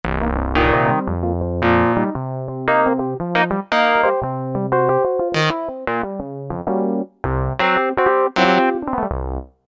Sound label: Clavilogue, Roland V-Synth; Factory Patches